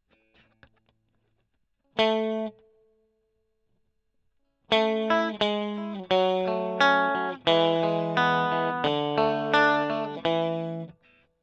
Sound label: E. GTR Gefell